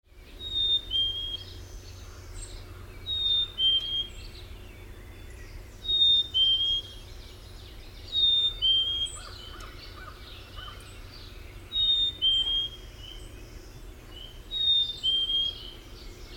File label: BCCH songs